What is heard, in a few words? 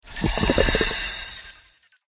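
moray eel; murena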